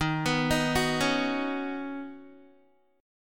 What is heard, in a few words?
D sharp Minor 7th Sharp 5th, Guitar; arpeggiated